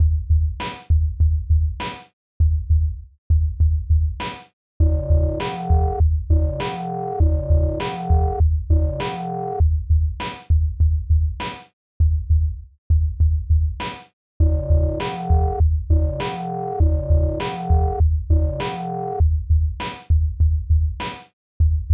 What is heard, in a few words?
Sinewave